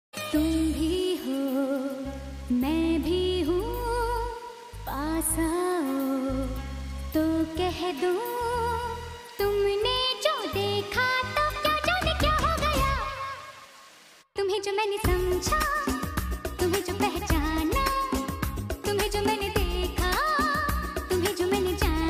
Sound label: female hindi ringtone